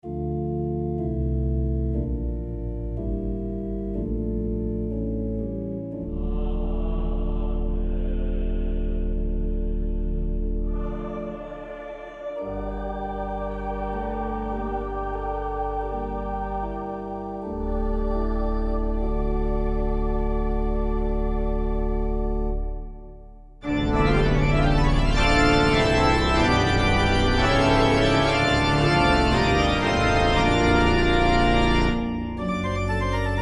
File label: pipe organ